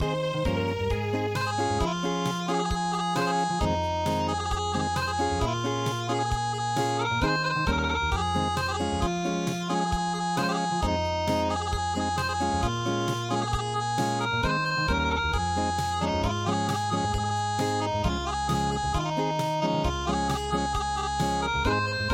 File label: Acoustic